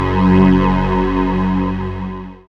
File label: SYN DANCE, Roland; Sound & Vision - Gigapack II